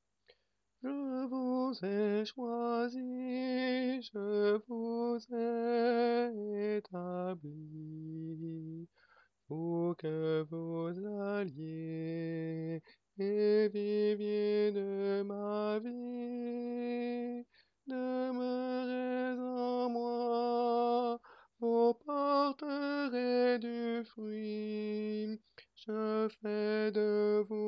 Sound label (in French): soprane; Je vous ai choisis; mariage